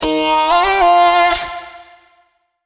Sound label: Vocal Sample Pack Phrases Eb; Slate Digital Omnivox Vocal Sample Pack